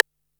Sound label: Boss DR-660 Snare